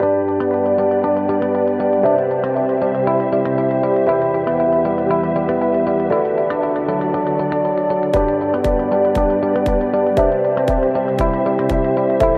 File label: Motivational Corporate Positive